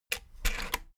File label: rotary control plastic rattle turn